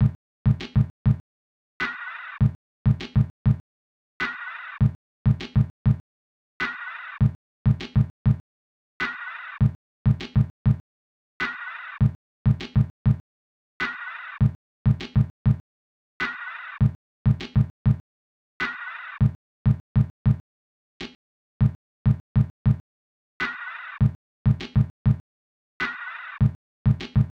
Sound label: Sine Wave